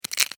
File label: Insect Claw Crunch, Insect, Claw, Crunch, Snap, Movement, Bug; Animals:Insects